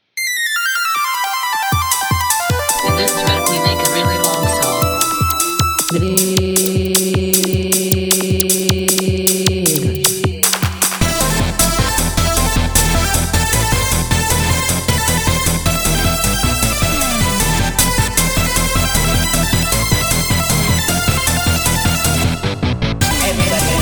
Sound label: Chorus